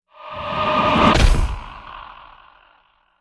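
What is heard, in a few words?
Animal Stinger Eerie; stingers & whooshes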